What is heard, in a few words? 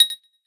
Ui Ok